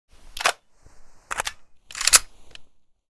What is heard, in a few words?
AK47 Reload Empty; zps; Weapons; Firearms